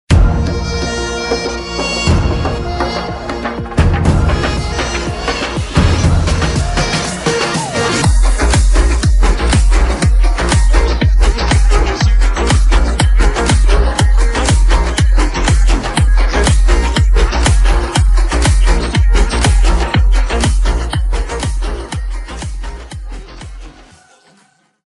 beat pop

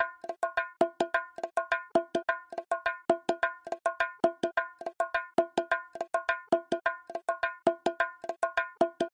BONGO 105BPM; bastaki; bastaki loop

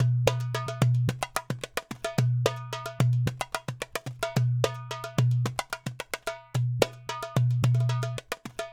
Darbuka 110bpm; arabi; Maxum

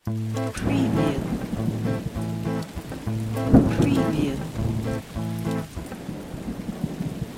Rhythm 5-8 Am 100bpm No Effect, electric guitar; RTM 5-8 Am 100bpm

